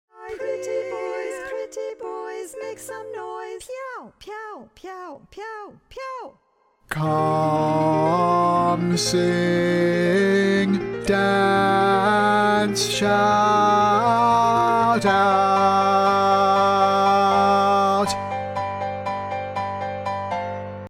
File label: Audition Bass Long Notes; Auditions